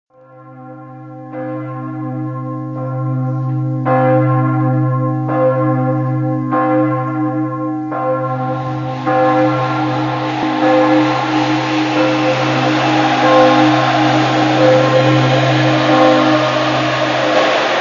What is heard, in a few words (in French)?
test mixage